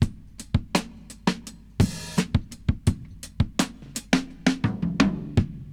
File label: breaks